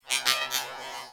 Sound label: Beep; Warframe; Corpus